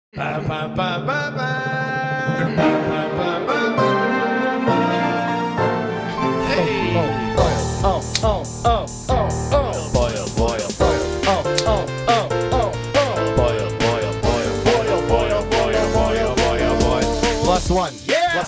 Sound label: fanfare